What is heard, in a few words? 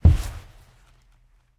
Body Wall Hit; Game rip; MiSide